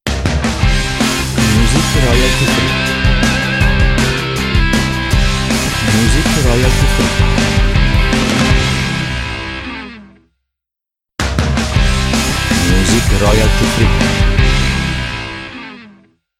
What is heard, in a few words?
Rock